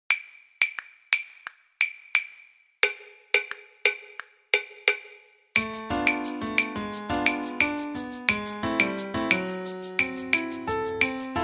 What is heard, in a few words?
claves